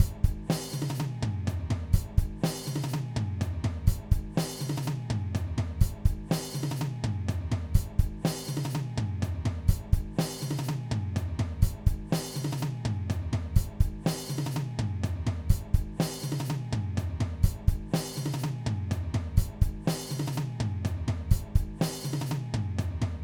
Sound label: drum loop